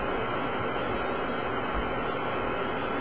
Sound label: computers; cs jackpot v2